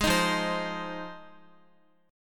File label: F Minor 7th F Guitar; strummed